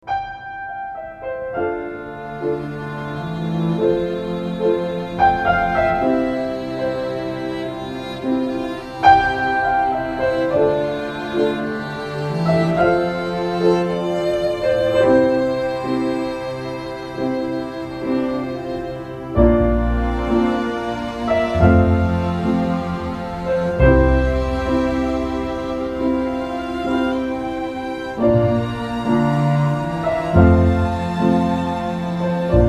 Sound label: piano